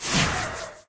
magic cast; minecraft; Votive Content

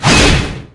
blade dance teleport; Minecraft; Votive 1.21.1; Votive Content

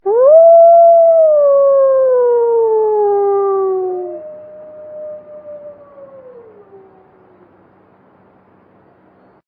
wolf; Minecraft; Votive 1.21.1; Votive Content

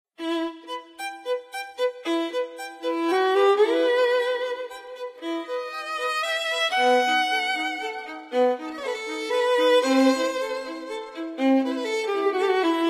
magic violin solo; skripka; Minecraft; Votive Content

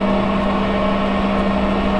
boil, kettle; cooking; Votive 1.21.1; Votive Content

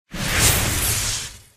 lightning skill; Minecraft; Votive 1.21.1; Votive Content

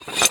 fill finish, teapot; cooking; Votive 1.21.1; Votive Content

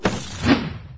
trebu fire; Minecraft; Votive 1.21.1; Votive Content

